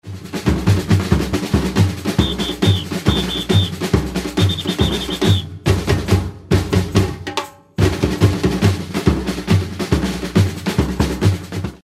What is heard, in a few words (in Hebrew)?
Whist Break, שריקה עם הפסקה; Batucada; מעברי שריקה תוך כדי סמבה